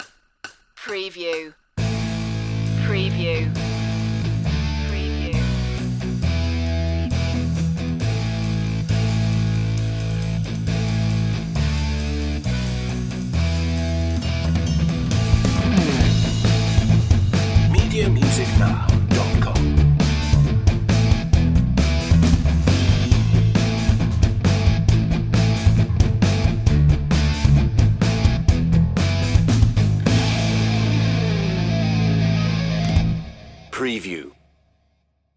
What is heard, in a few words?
no lead guitar